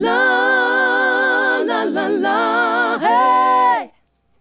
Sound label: Female Group, Hip-Hop and RnB Vocals